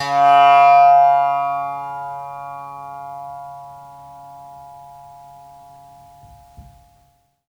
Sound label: Indian Percussion Tabla; Mango Loops